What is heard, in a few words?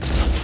RCS small